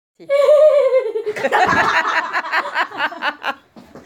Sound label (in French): rires